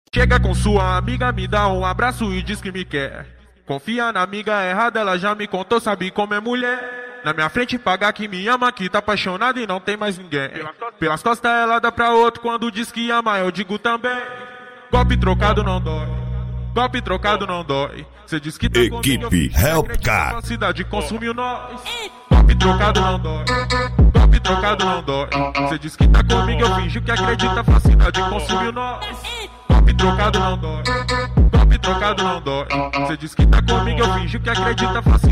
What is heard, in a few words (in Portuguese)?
funk grave bass boosted; funk do tik tok; equipe help car